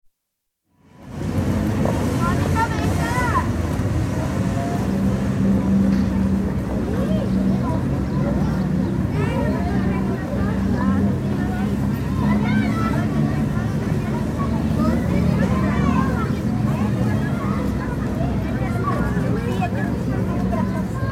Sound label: Spain: Large open square Cadiz, general atmosphere with children, mothers & traffic; Outdoor Sound Effects; BBC